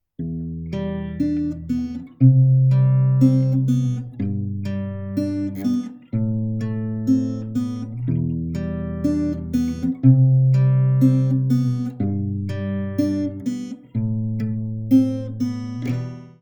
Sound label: guitar; STALZONE; customnpcs; stalker